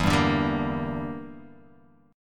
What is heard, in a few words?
E Minor 7th Flat 5th E